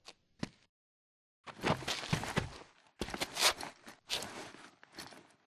left grunt mvmt; Titanfall 2

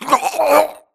grunt death neck; Titanfall 2

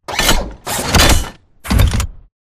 dash forward; Titanfall 2

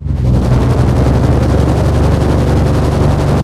vertical fan switch startup distant; beacon; Titanfall 2

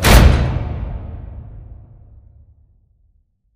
giant metal door open stop; Titanfall 2

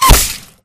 bullet light ballistic kill shot human; Titanfall 2

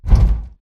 bt footstep jog parts; Titanfall 2

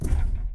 footstep jog; Titanfall 2

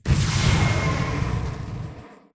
boost jet body; Titanfall 2

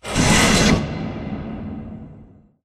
pod door lg verb open slide; Titanfall 2

